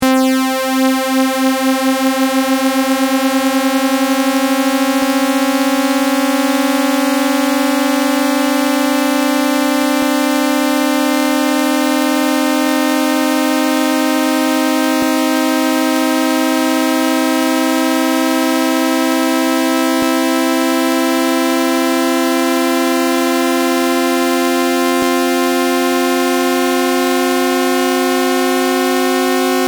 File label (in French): do 523 scie; helmholtz